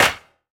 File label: clap one shot D key, Royality free clap one shot tuned to the D note. Loudest frequency: 2517Hz; percussion; single hits one shots